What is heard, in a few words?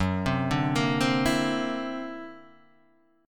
D Augmented 9th Gb, guitar